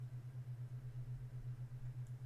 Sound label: ambient hum